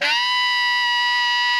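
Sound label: Tenor growl B5, Saxophones; Greytsounds; Philip Wolfe David Crozier's Saxophones; TENOR SWITCH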